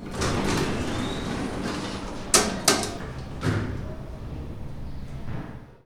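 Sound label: elevator open; 1000fx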